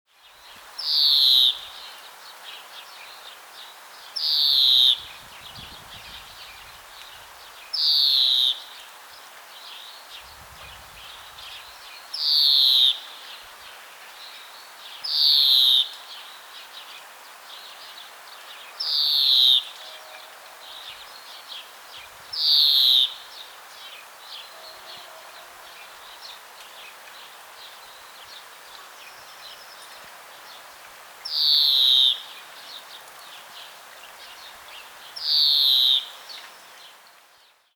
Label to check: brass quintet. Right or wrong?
Wrong